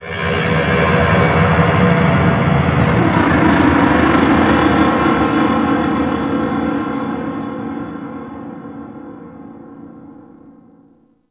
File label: Ocean Underwater Sentient Metal Creaks; Warframe; Grineer Ocean